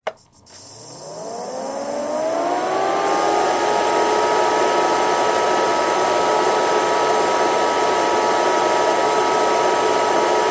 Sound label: household air cooler electric on run off front pov outlet; zapsplat